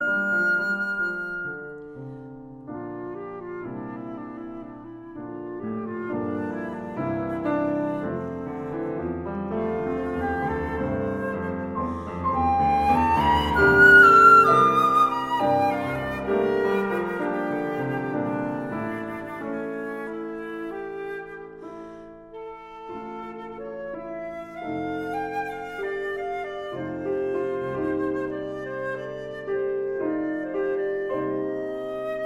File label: for flute and piano moderato; une flte soupire op 121